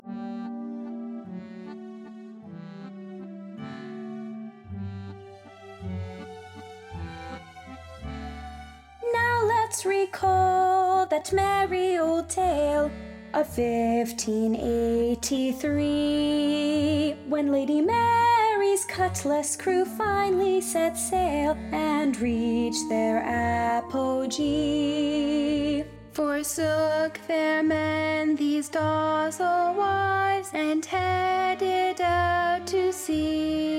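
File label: orchestra; Ballad Opening; demo; 2020 Cutlass Crew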